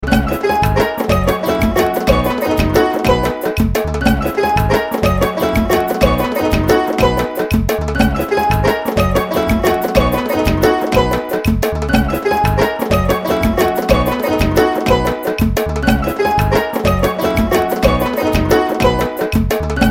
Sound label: Salsa; Nokia